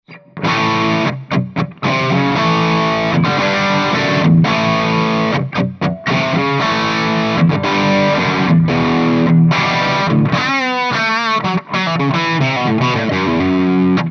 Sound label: rockerverb 50 dirty